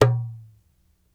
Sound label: Dumbek Attack, World Percussion One Shots; Sony CS